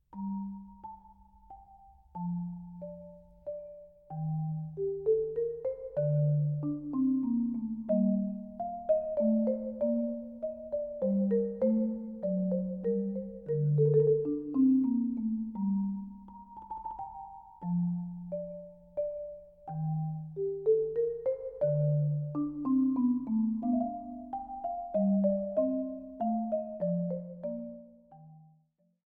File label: Menuet